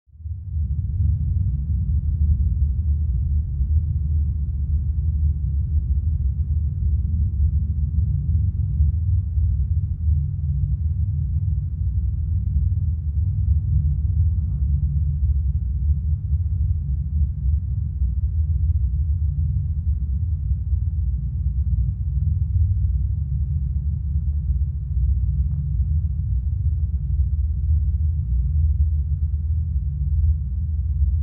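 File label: cave, rumble, ominous atmosphere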